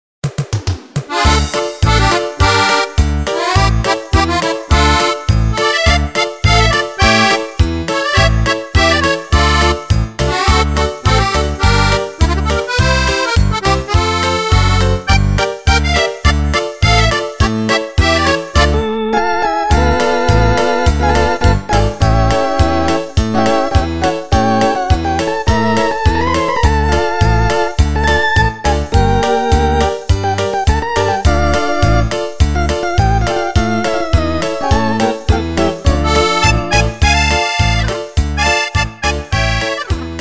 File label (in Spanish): Instrumental Bailando el Fox Trot